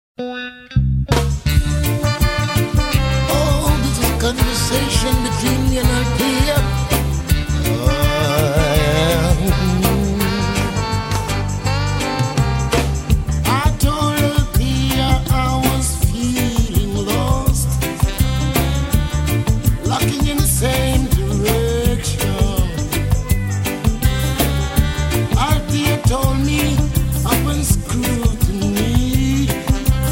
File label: Reggae